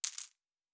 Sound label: Coin, Fantasy Interface; Heroes of Hiis; Fantasy Sounds Bundle